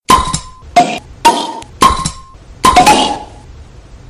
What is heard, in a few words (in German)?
bergbier plopp